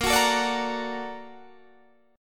A sharp Augmented Major 7th A sharp Guitar Standard; strummed; JGuitar